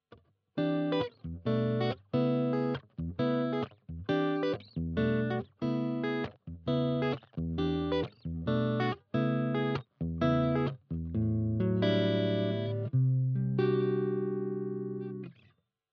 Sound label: DUAL CUBE JC Clean dry